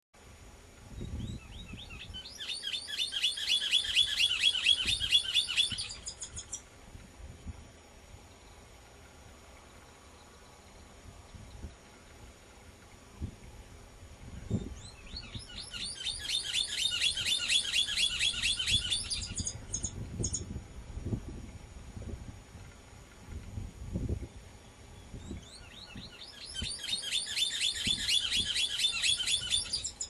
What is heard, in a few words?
Chinchero Grande, Drymornis Bridgesi, Scimitar-billed Woodcreeper; cantos